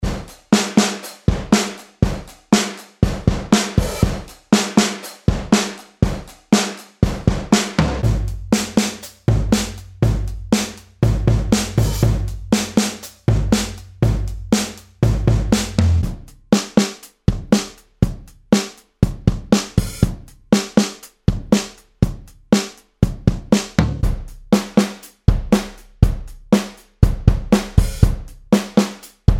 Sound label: 60s Rock